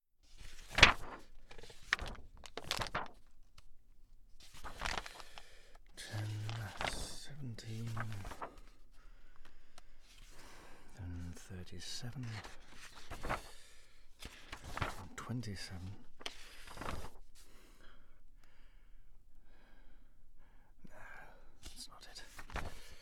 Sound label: Foley Turn pages of large tide table book; The 39 Steps